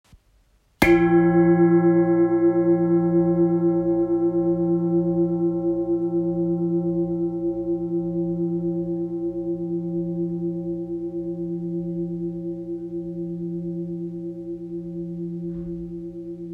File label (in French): Bol grave 8kg bouddha Fa